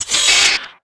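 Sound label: droid spider attack; Star Wars Knights Of The Old Republic I; XBOX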